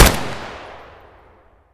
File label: adar fire outdoor distant; Escape from Tarkov